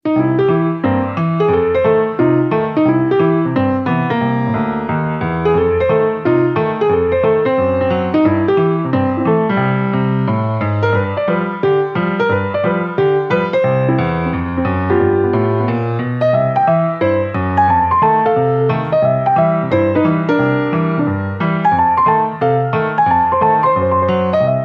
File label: solo ragtime piano; 60sec